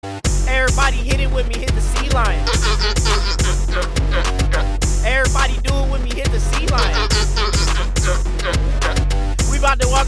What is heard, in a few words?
sea lion rap shortened